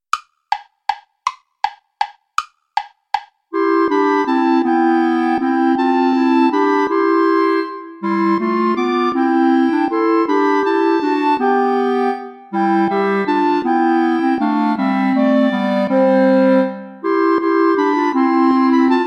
good christians all rejoice and sing play along without bass clarinet; vulpius melchior